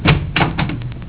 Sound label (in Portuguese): porta abrindo; Sons domésticos; rangendo